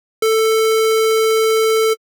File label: square wave; Pigments